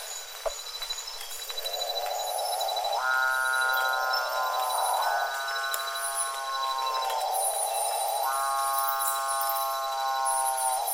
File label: capuchinbird